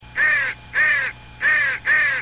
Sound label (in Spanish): cuervo; animals